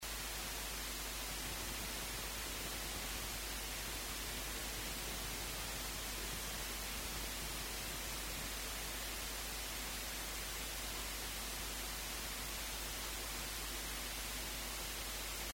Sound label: 15k 820 opa unterm termed